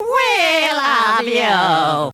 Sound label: VOX; Zero G; Man Machine